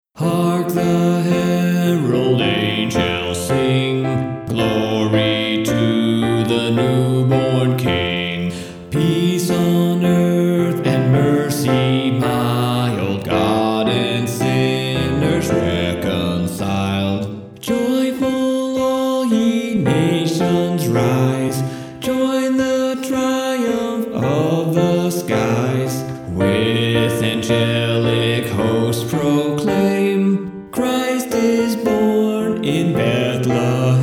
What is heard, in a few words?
Hark Bass; christmas choir rehearsal tracks